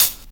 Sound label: 2000s hip hop hi hat sound a sharp key